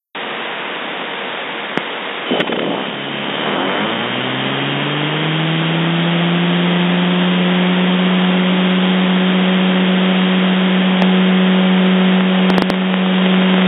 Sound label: interference AM